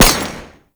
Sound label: Nail Grenade Shot; Warframe; Trapper